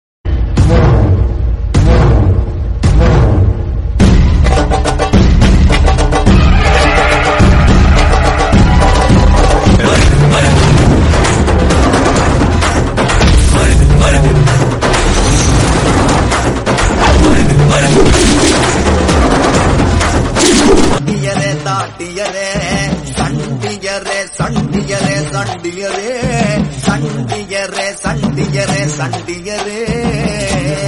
bgm theme; maruthu

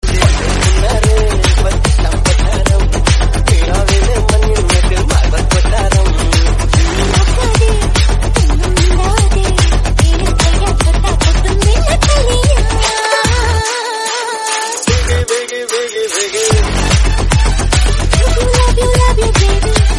Remix Song

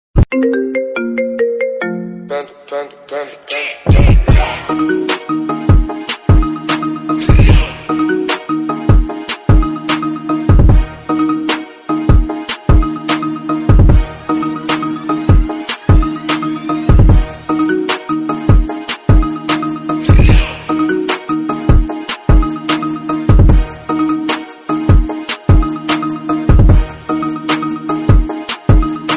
iphone remix tone

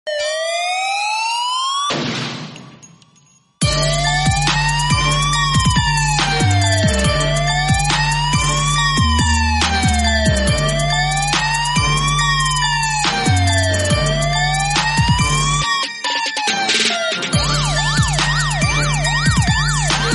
police sirens; telangana state police

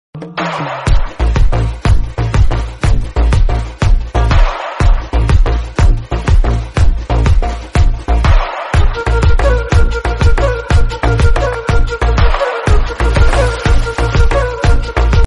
theme instrumental bgm; kaduva

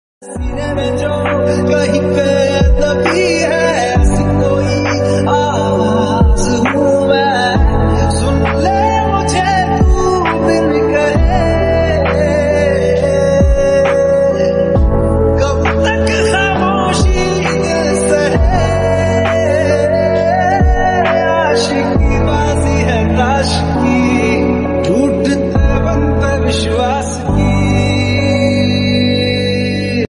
slowed reverb

